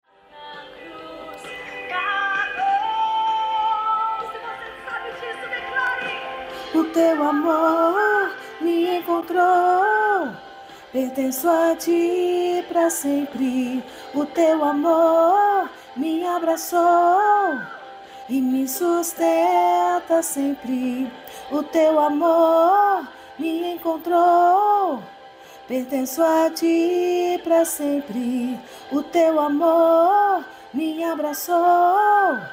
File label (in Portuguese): contralto